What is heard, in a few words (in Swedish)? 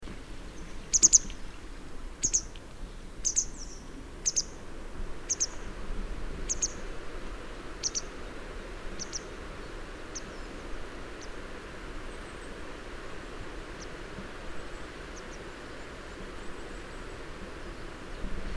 Forsaral